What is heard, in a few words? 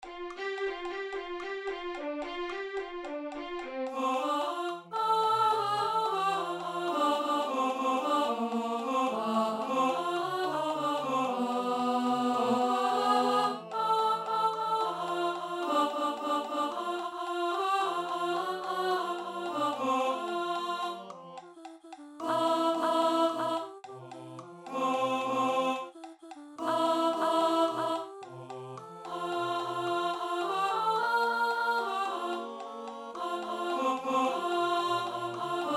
Alto